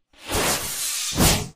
Genie Attack; doodle jump 2; android

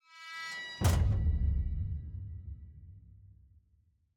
Morgue Door Close

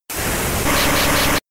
Water Gun

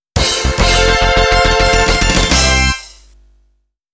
Mission Clear (Stage Clear); psikyo arcade sound digital collection vol. 04

